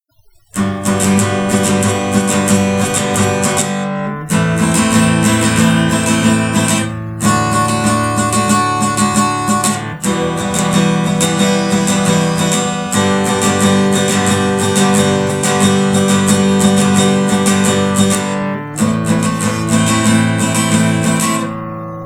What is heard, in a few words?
Chord progression in G